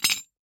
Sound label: move rook, chess